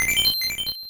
Mono Stereo 1up; super spy hunter; nes

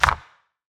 Hero Landing; portal quest; android

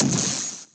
candy shot; down candyshot

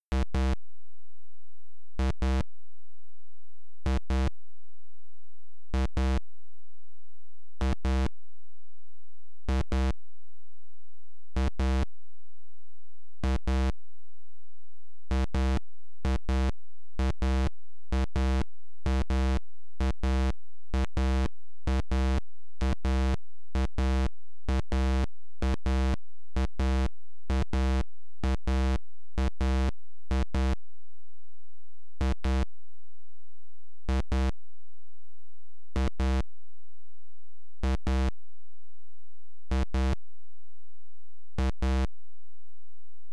IBM PC-Speaker, Game Over; Dinopark Tycoon

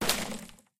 Wood Crack; Doodle Jump 2

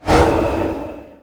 Whoosh Small Evil; god awe full clicker 2018 gamerip

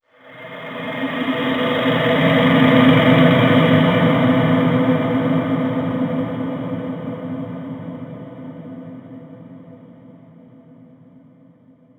environment graveyard stinger ethereal

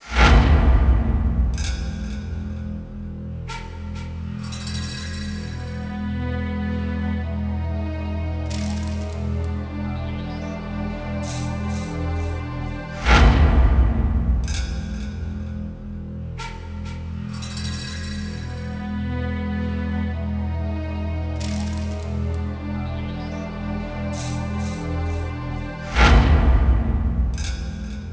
Bgm Chapter Clear

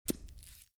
Tortures Injection; This Is the Police 2

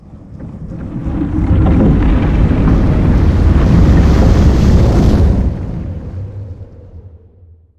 Whoosh

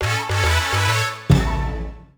Unused Fanfare; Ganbare Goemon Uchuu Kaizoku Akogingu

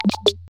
Pickup gun; gonner linux macos ps4 switch windows xbox one gamerip 2016

